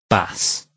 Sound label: bass